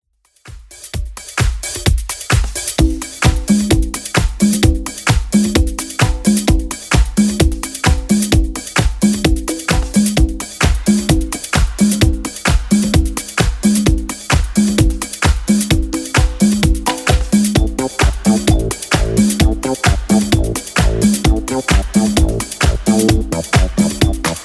70s Club 130 bpm CLEAN

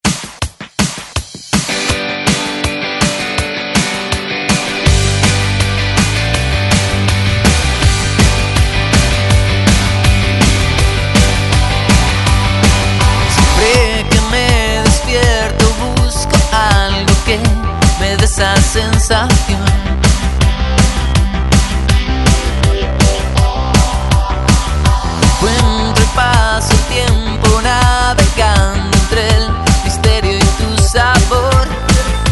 Latin Rock ReDrum, 162 Bpm; DJDOLLS

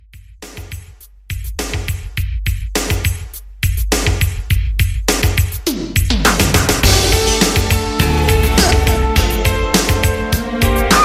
Pop Boyband Re Drum 103 BPM

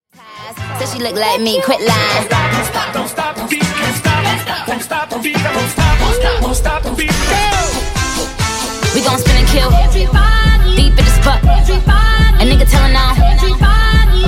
69Bpm

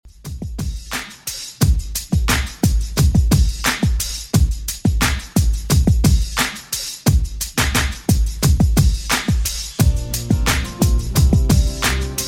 70s Redrum 88bpm

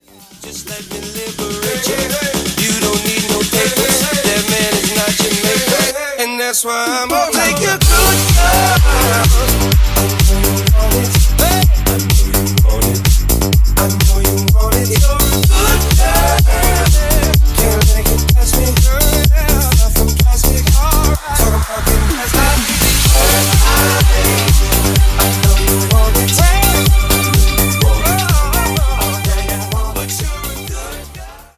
New Disco 126bpm D; Redrum

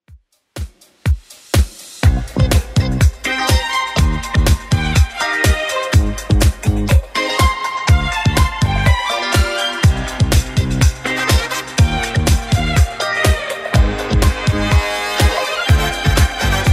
70s Redrum 123bpm

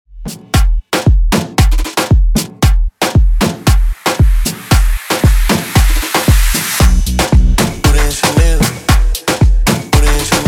Re Drum Moombahton

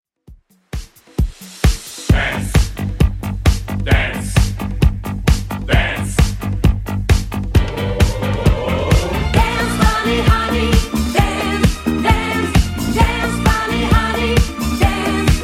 70s Redrum 132bpm

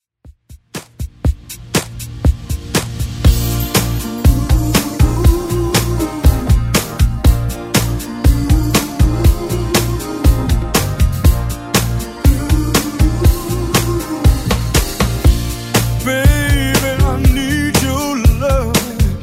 80s Redrum, 120 bpm

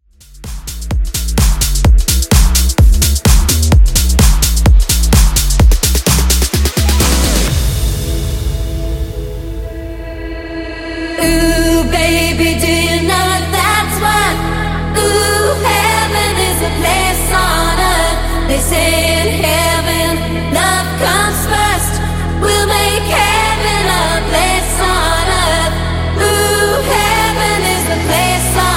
128bpm

